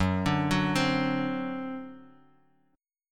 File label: D 7th, F sharp